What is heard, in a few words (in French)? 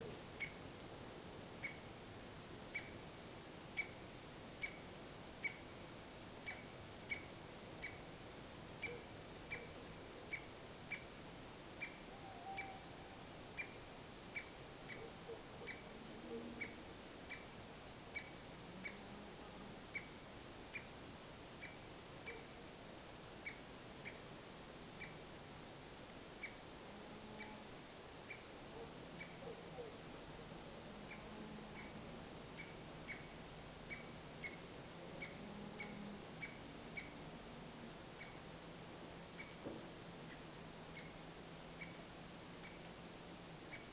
Pic de Lilford cris; Sainte-Engrâce